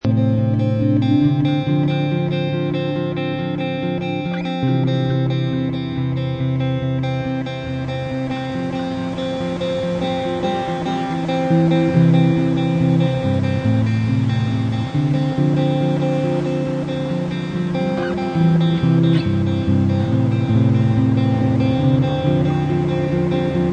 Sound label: The Glass Harp